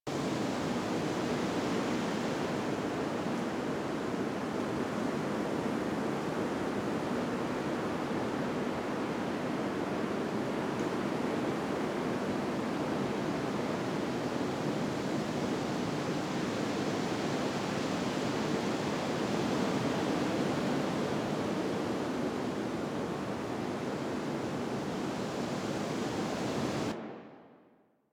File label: Forest Exterior Crevice Air Amb; Warframe; Ambience; Grineer Forest